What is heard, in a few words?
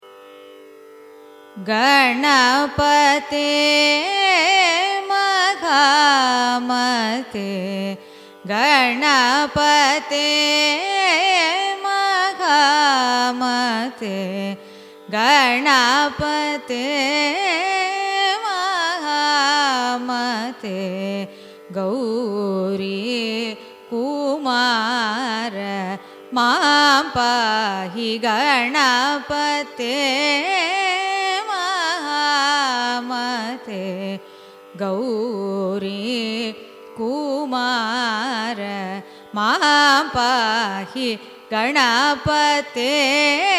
raga kalyani carnatic